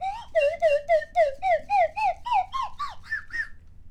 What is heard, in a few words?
Drunk, Slide Whistle